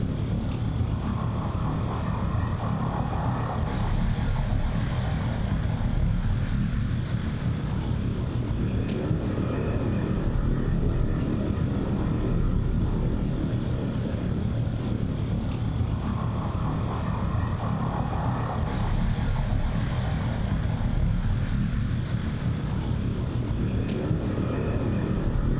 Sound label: ship corvette idle; Stellaris; machineage; ships